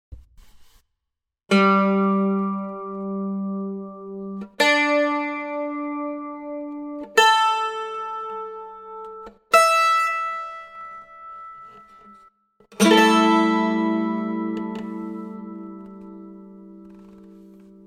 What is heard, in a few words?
mandolin notes